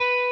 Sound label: Arp.